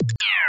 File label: cancel; Allegiance